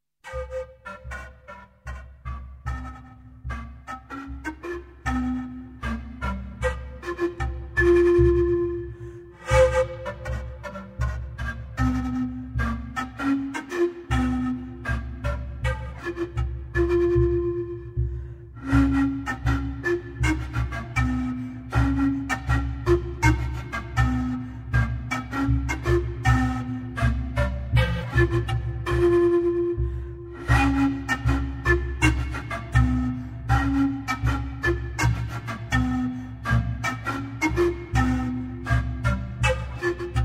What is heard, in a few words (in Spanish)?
Flauta de pan